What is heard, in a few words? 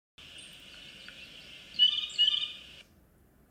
blue jay song